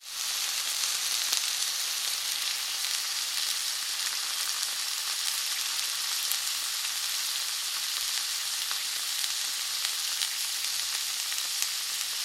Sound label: fried or grilled